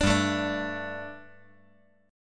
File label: G Suspended 2nd, Guitar